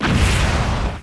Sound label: Explosion